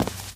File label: walk tile, footsteps